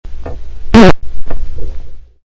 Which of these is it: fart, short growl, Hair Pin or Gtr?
fart